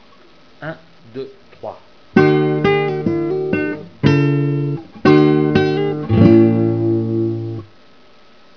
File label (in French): MENUET, guitare